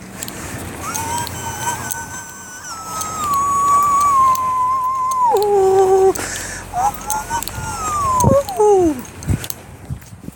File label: Whistle tones